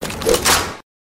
deploy high five

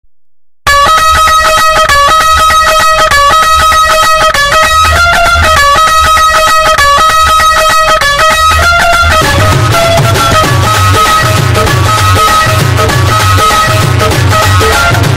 loud indian music

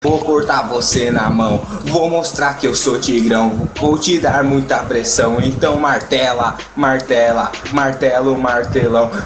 game over

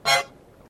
honk

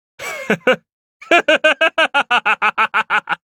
laugh

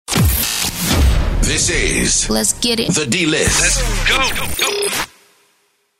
Drops

